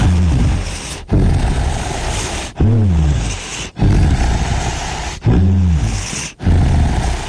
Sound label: growl; nothing there